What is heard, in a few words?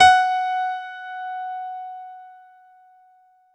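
F#4 DANCE, ANALOG SEQ; Roland JV 2080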